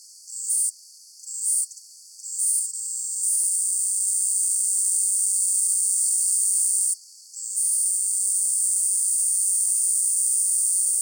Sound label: Cicadettana calliope frequency altered, cicadas songs; US